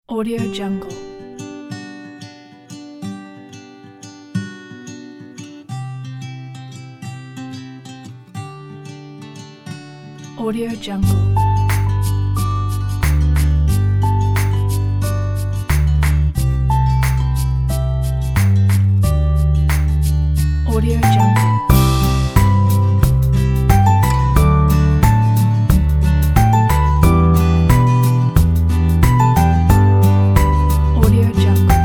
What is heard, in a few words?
uplifting folk